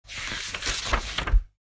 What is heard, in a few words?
Large book manual page turn